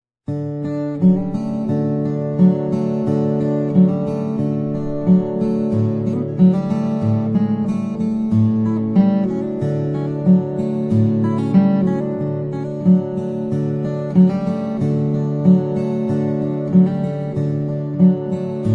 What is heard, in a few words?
Slack Key